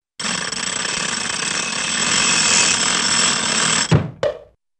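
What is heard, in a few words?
Mechanical Ratchet with metallic Gear Clicks & Clanks, cartoon; Hollywood Edge; Cartoon Trax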